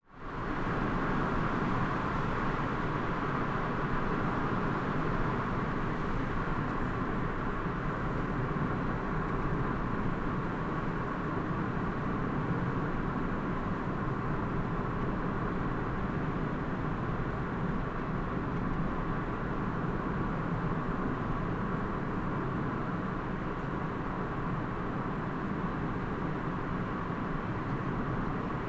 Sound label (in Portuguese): Som de carro FIAT andando pela estrada; maquinas; colecao sonora do cerrado